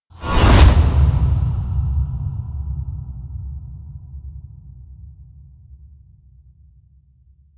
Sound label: Cut Scene Stingers